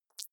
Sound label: hover high, signale